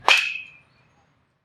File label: hit bat